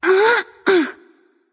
grunt lift light; smite; voicepack; hel

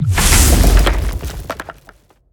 recall portal emerge; smite; hera

